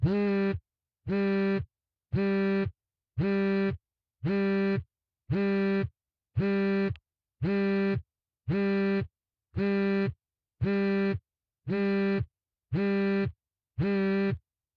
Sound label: phone vib alert; devices accessories utilities; NS Studios - ultra sound collection